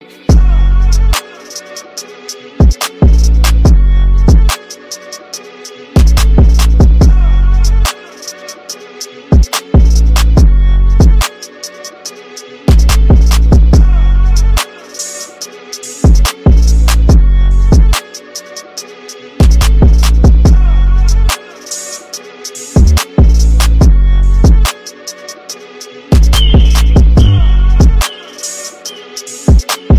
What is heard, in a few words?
INVINCIBLE BEAT RINGTONE